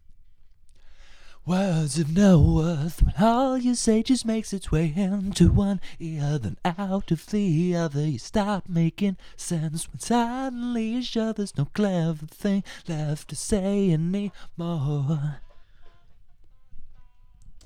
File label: vox; logic